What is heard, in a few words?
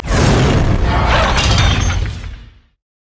recall winddown; LoL; velkoz skin01